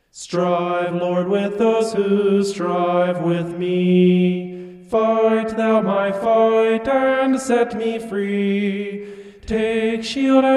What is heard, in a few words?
vocal homoph; psalm 35